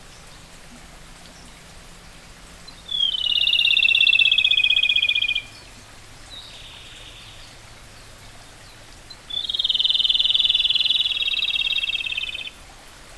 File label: robin rufous tailed Larvivora sibilans